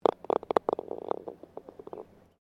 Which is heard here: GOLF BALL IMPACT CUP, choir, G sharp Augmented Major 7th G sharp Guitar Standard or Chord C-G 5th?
GOLF BALL IMPACT CUP